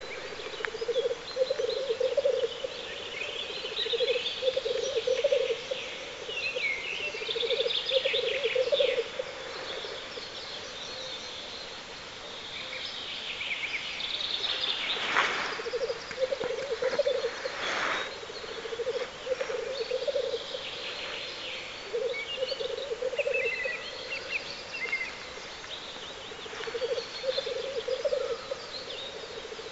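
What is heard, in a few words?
teterev golos, black grouse voice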